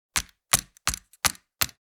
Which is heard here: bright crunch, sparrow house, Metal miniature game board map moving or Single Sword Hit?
Metal miniature game board map moving